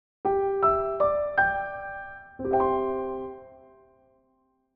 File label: logo